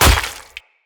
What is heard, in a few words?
Dagger Impact Flesh; Weapon Impacts; Daggers; Dendrit Dagger; Combat